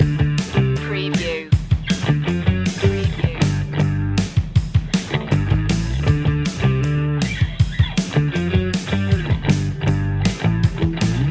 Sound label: turbo energy rock loop